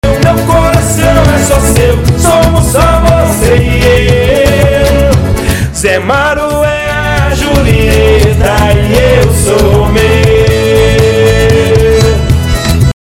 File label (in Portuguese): Vinheta Candada